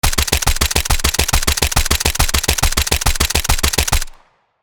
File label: Modern machine gun fast shooting long; guns